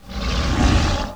Gorgon Attack

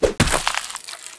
Break

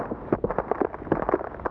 Bone

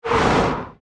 Blast Poison

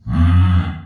Attack; Argon

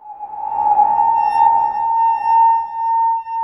Cursed King

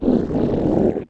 Angel Idle

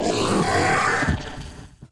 Soldier Death

